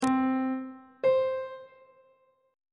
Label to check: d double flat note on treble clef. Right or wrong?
Right